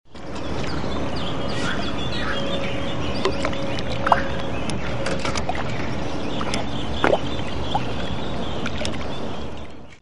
Partie de pêche; bruitage

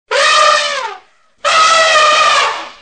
Elephant, Animaux; bruitage

